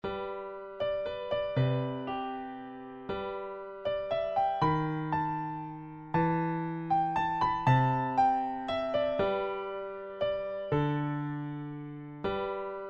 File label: Level 4 Piano Midi